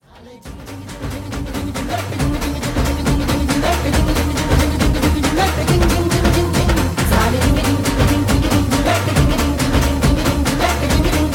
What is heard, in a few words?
Marathi Dance Song Ringtone